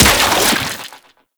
Infested Rifle 3D Charged Fire; Quanta; Weapons

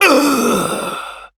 Pain Long; New War; Ballas

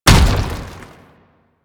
Step, Foley; Enemies; Warframe; Proto Infested; Babau

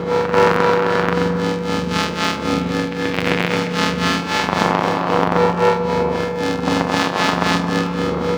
Ambient Tech Drone; Warframe; Tau; Ambience

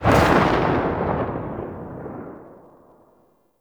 Revolver Rifle Tail, Weapons; Warframe; Tenno; Mod Quest

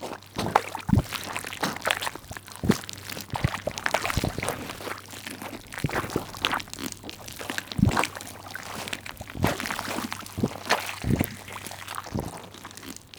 Goop Slime Fall; Ambience; Props; Warframe; Infested Eris

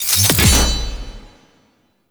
Armor Boost; Warframe; Colonist Rescue

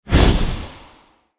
Casting Device Cast Heavy; Galene

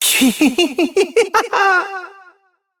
Evil Twin Doppelganger Laughter; Battle Barks; Warframe; EntratiDoppelganger

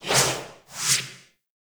UI Login Reward Box Slide

